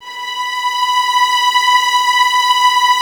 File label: Violins B 5, String Ensembles; Miroslav Vitous; LINKS ORCH